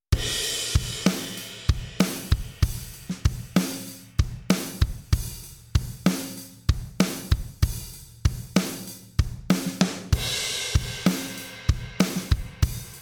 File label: Drum ART SCL2 Limit